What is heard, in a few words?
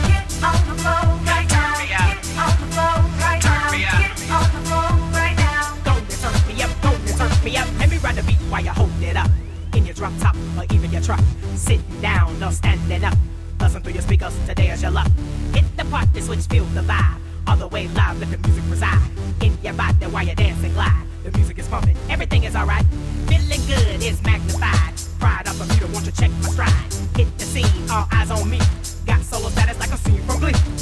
sports music sample